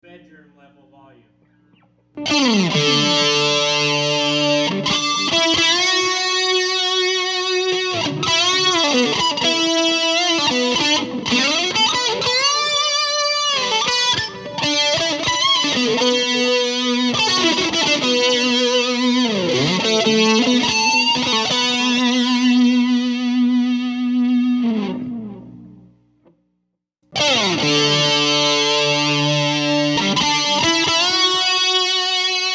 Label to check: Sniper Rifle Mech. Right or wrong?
Wrong